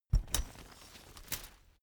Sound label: umbrella big close full